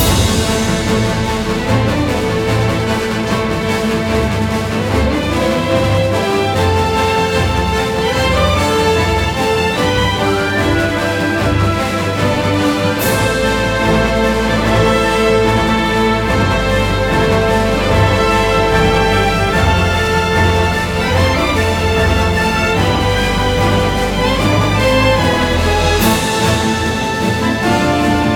Boss Battle Loop; Gran Saga

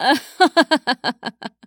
voice female a laugh; Infernal Radiation

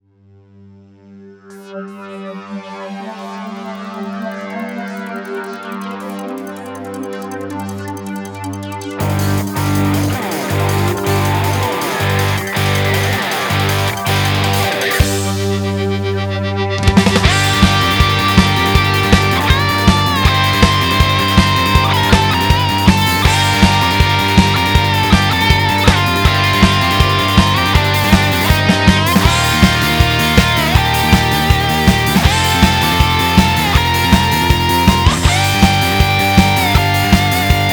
Rock Remix; The Ghost Blade; Soundscape Collection

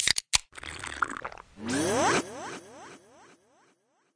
Strong Bull

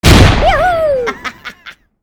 Explo Gun; LooterKings

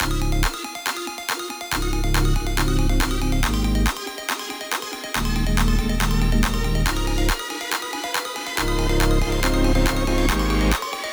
Dubstep Victory; Bogheim

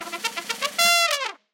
safe success; macguffins curse

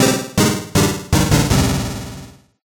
MUSIC EFFECT Solo Chip C64 Negative stereo; Deep Dark Fight

